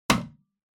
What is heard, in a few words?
Intercom Button Talk